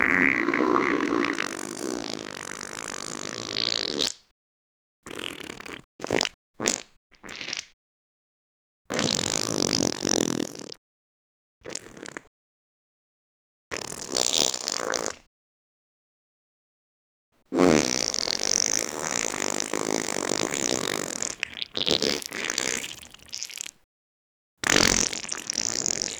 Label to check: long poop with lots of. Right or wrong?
Right